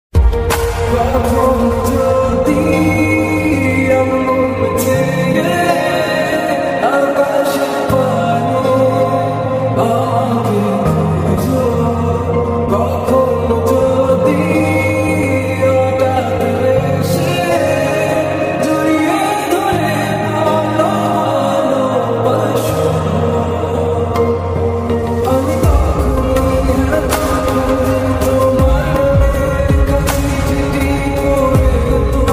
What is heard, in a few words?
Bangali Ringtone